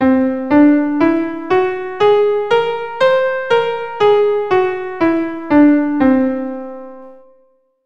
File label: C whole tone scale, keyboard